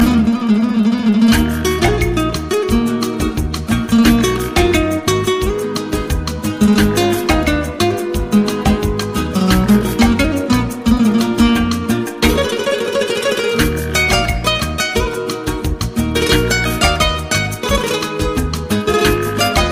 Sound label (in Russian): ispanskaya gitara